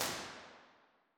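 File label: impulse response, nuclear reactor hall; b-format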